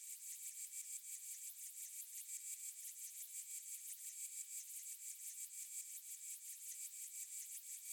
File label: Battery Indicator Machine Broken Loop